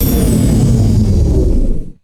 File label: fast sci fi turbine power down